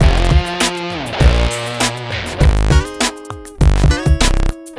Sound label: rnb; Google; NewWave Labs